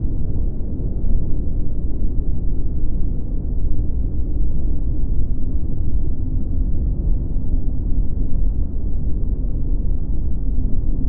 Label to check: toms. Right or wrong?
Wrong